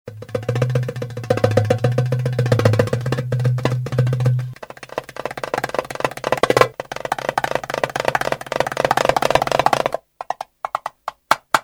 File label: Takasim Tabla, Oriental Belly Dance